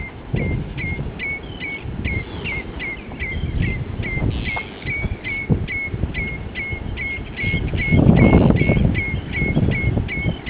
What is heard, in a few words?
redshank